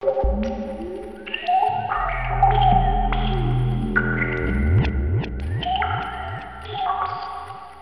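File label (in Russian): таяние снега в пещере падает окружающий звуковой эффект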